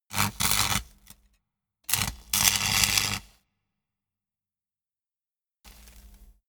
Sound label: spark